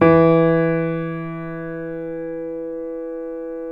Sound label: PNO MF; Synclavier Sampler Library